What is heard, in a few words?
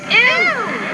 ewww